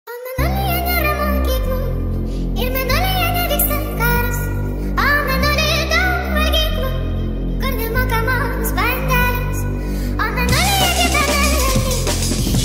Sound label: hardstyle